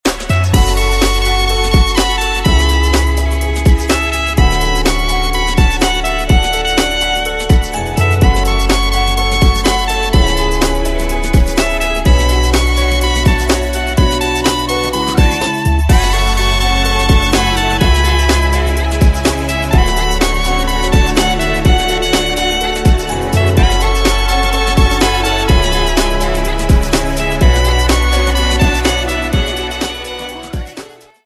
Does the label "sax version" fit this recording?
Yes